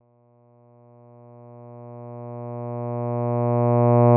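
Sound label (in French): A, un "a" d'une voix de basse, variation de l'amplitude A de 20 à 5000, sans vibrato; AteliersHenrich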